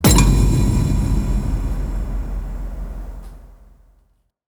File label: cinematic glass hit suspense; mixkit